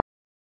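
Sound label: cursor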